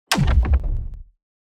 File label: building destruction low